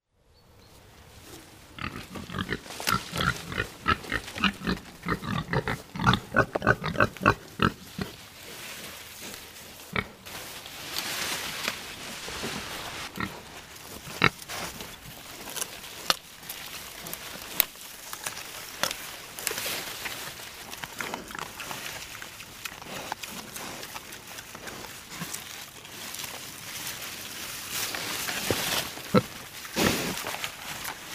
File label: excited pigs being given hazel branches and they search for the crunchy nuts to eat